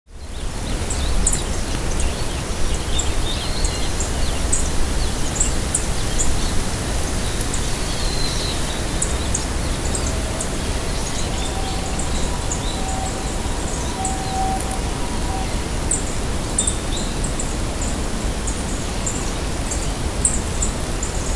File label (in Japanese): kikuitadaki